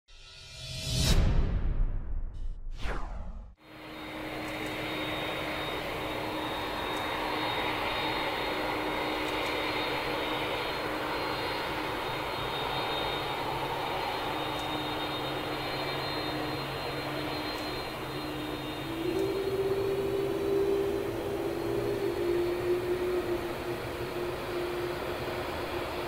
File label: Airbus Beluga